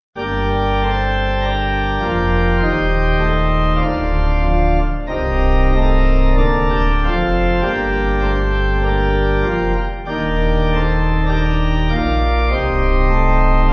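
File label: Pipe organ, church music backing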